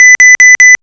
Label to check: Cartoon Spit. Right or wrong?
Wrong